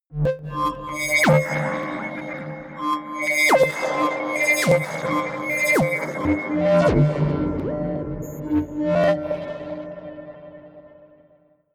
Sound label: huge distortion sequence